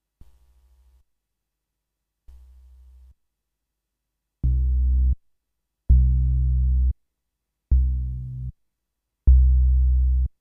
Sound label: Monopoly noise test, Korg mono-poly; Noise problem when key is pressed